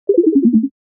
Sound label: Error notification alert